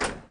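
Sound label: front door close hit; volvo 9900; Freyfurt Beta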